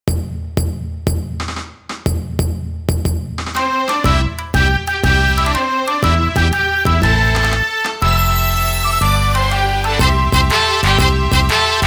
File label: Bon Odori